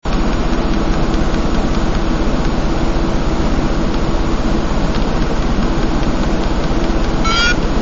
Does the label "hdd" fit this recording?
Yes